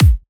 Kick

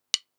Snares & Claps, One Shots; Touch Loops; CosmicFunk

